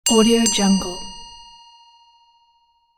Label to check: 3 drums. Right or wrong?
Wrong